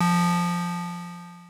synth poly c2

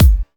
Bassdrum; Vengeance Samples; VEE Bassdrums

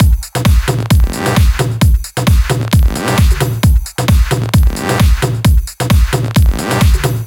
132BPM TomTom Kit

